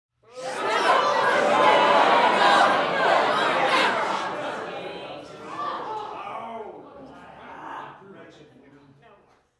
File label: Disapproval Alt